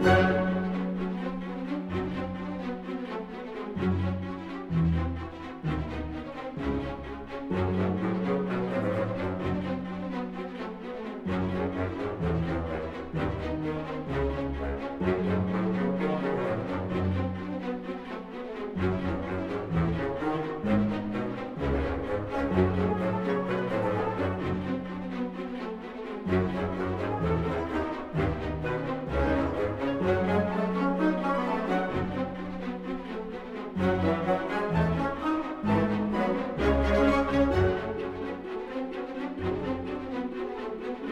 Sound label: Danger 64bpm Loop; Music Dialogue